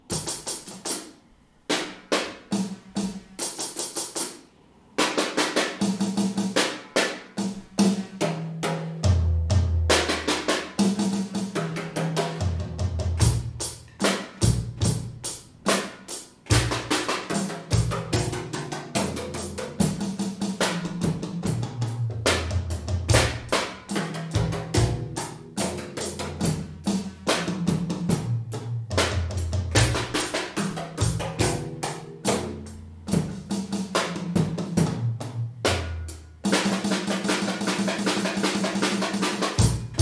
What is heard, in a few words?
Drum Solo